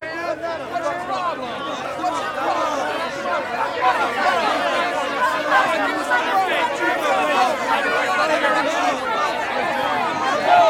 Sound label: Crowd Walla Dense Angry; Poetry Project